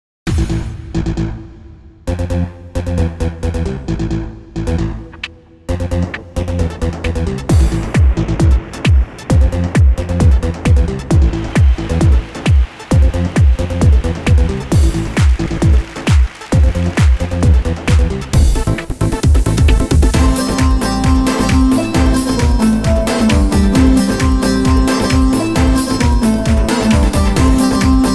baglama

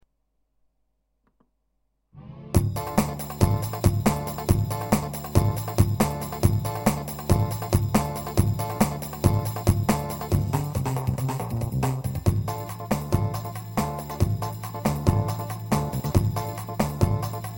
pa-500 tr roman ritim

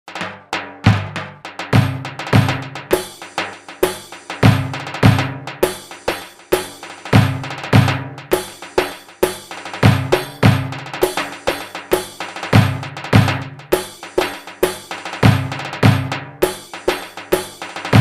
gw8 davul roman; gw8 drum kitlerle fl9 ritim convert

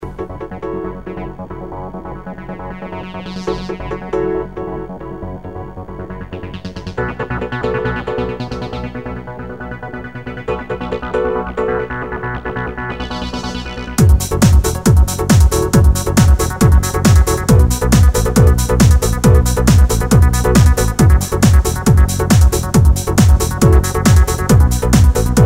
techno halay; ketron

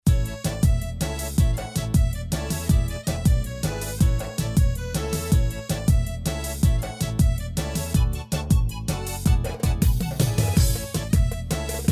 rmeli 7 8, yamaha psr a300tr

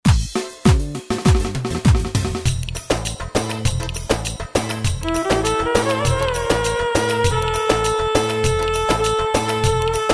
2-4 ciftetteli

